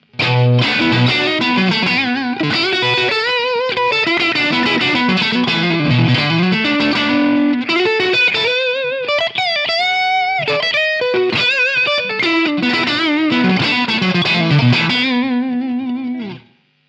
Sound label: super reverb bypass